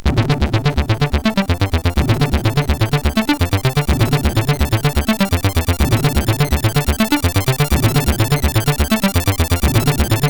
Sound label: Orcus dark lord, CSG8580R5; hvsc